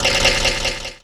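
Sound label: HH, hi-hat, drum kit; Sonic Foundry (Sony Creative Software); Esoterik Beatz; Percy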